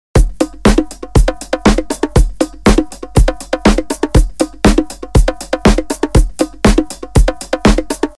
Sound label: 120 loop with topper